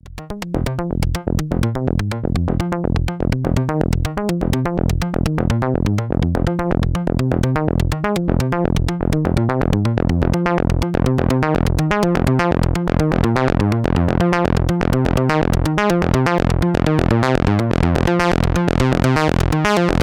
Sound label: Axxe Pulse PWM